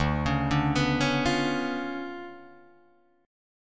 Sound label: D Minor Major 13th, down, Guitar, DADGAD; DmM13; arpeggiated